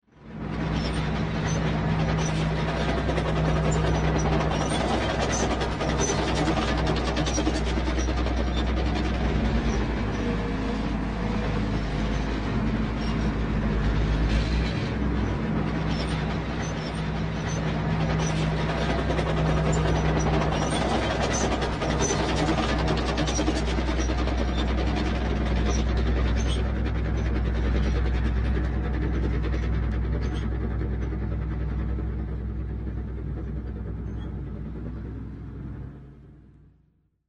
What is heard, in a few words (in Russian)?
transport na gusenicah